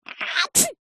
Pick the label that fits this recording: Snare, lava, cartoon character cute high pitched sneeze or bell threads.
cartoon character cute high pitched sneeze